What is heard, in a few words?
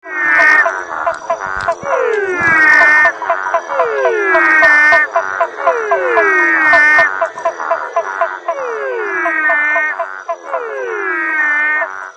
Physalaemus Marmoratus; amphibia